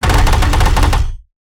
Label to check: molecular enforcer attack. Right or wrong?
Right